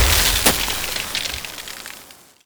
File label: skill ice death